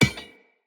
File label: Orb Cannon Drop Impact